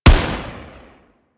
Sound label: Arch Long Rifle 3D Fire, Weapons; Warframe; Archwing